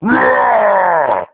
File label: male uaaaa; human